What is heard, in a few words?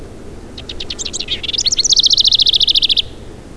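House Wren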